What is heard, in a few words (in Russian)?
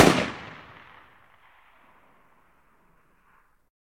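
протяжный выстрел вдаль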